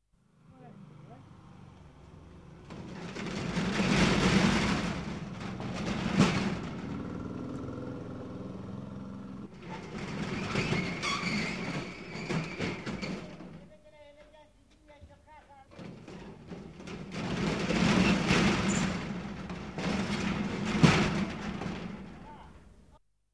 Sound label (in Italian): SARACINESCA